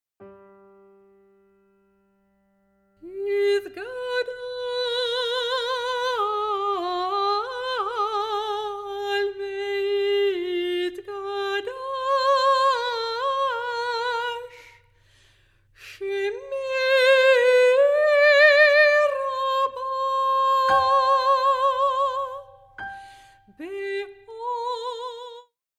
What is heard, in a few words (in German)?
kaddisch fragment